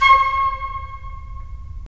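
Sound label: FL, flute; LA Composer vol. 3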